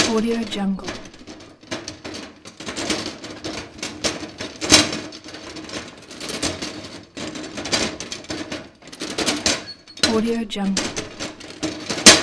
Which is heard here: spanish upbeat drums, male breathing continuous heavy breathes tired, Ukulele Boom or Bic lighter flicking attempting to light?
male breathing continuous heavy breathes tired